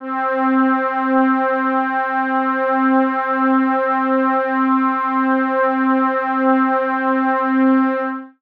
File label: PAD Army - C, Synth One Shots